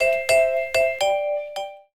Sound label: Amenity; BLU Studio-5.5-S; notifications